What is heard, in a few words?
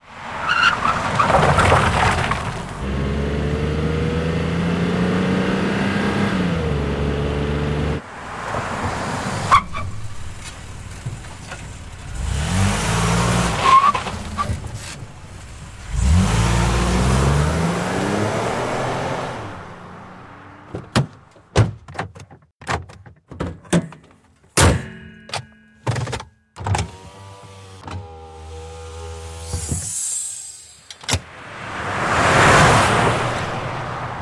toyota highlander